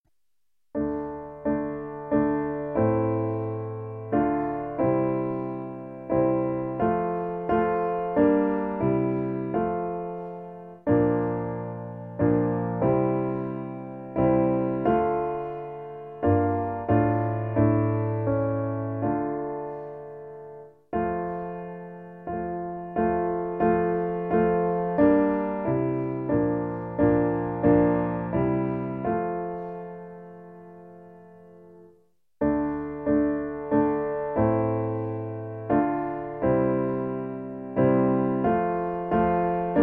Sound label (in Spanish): Mas vale trocar piano